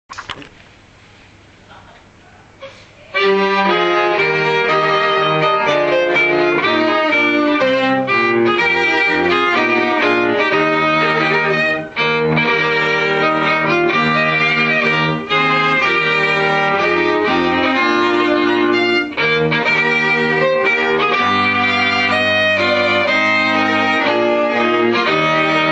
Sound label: string